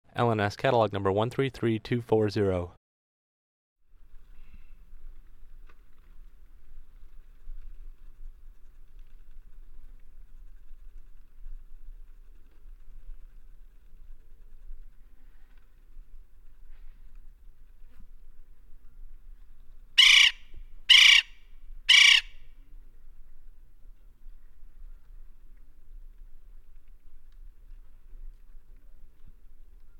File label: west indian woodpecker